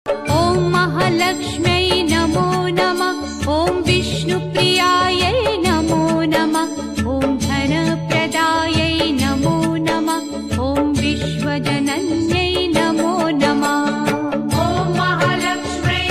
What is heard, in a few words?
bhakti ringtone; om mahalaxmi namo namah om vishnu priyaye namo namah; lakshmi